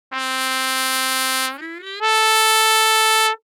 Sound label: half valve, the trumpet; samplemodeling